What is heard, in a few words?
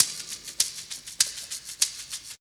Percussion Loops, Shaker & Tamb; Houseworx